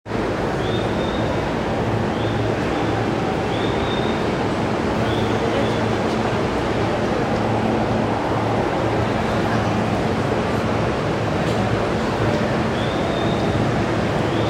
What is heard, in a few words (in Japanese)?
京王線調布西接近, train sounds